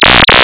activate, probe

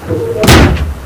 door metal close

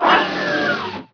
die; unreal

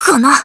charge shoot

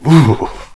male unconscious; jcdenton

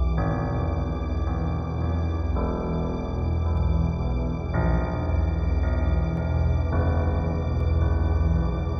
calmness, music

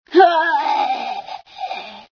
scream, female sci

